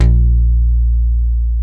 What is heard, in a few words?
A#1 PICKED, METALLOID; AKAI S-Series CD-ROM Sound Library VOL-7